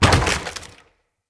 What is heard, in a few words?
lantern crate land; Objects; Ode Lantern Crate